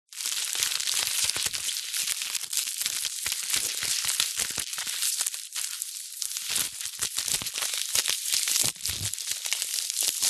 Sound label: office sellotape ball handle sticky